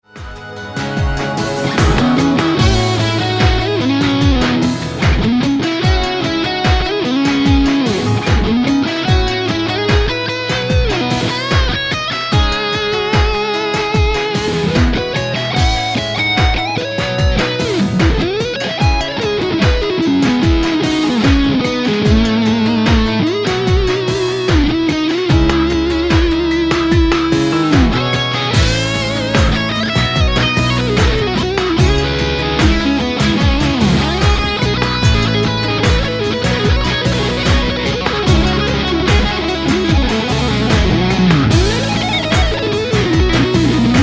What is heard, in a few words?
rock song; Tonerider